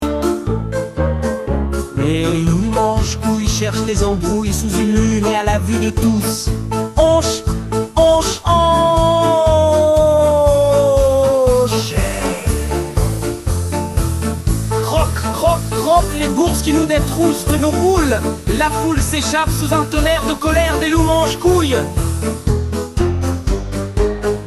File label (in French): Loup Mange-Couilles Chanson